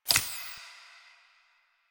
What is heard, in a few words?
Laser Aim Exit, Pistol; Weapons; Warframe; Tenno; Lasrian